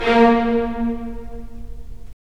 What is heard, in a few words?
STR, STR Full Short; Roland - LA Composer vol. 4